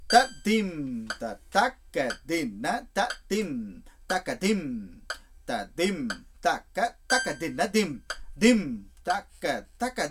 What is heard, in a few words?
phrase chatusra nadai